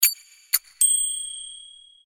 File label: Palm Pre Cymbells